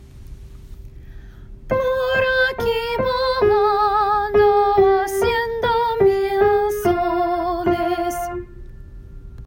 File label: solo